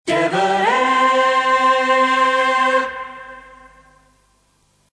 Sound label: short high, idents; devonair